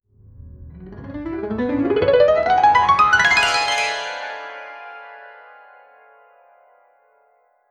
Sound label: motivational rise sound, soft piano